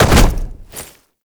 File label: Land Heavy Default, movement; Warframe; Uriel